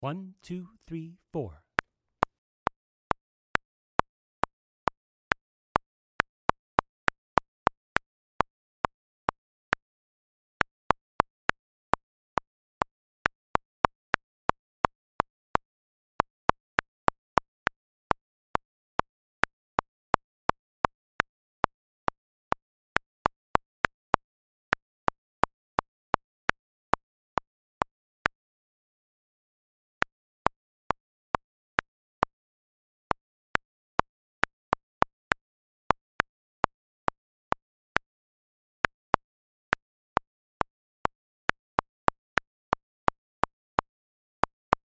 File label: Maniacal Metronome